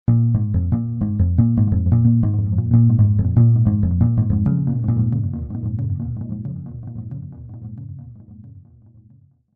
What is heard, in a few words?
slide mix fx